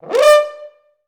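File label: french horn D4; Best Service; Peter Siedlaczek's Advanced Orchestra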